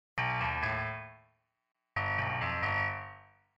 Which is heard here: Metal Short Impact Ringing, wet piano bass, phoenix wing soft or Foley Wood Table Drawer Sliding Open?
wet piano bass